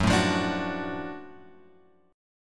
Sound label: F sharp Minor Major 7th Sharp 5th; F#mM7#5; {2 0 3 X 3 2} strummed